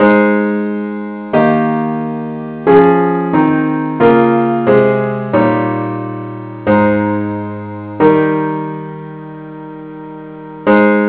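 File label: parts only key a flat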